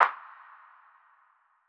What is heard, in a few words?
Snare B Hit, Bmin; Hip Hop; Hip Hop Exotica; Apple Loops; Big Fish Audio